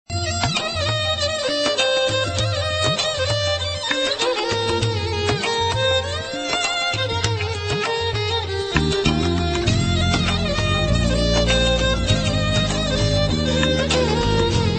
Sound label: soft tone ringtone